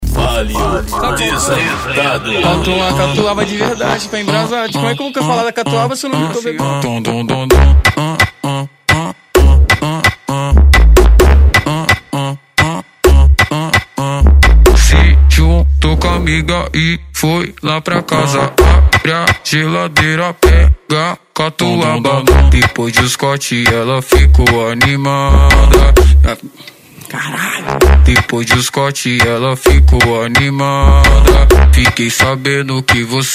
especial funk

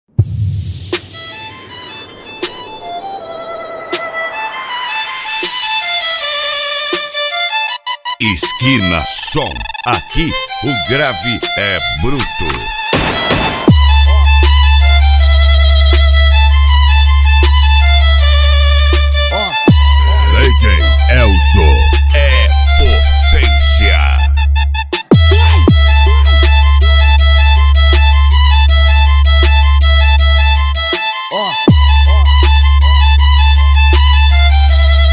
violino; skina som luiz antonio sp

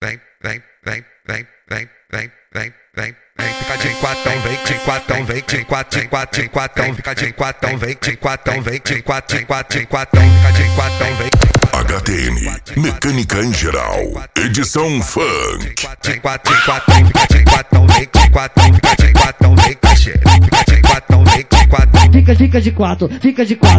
mecanica em geral edicao funk

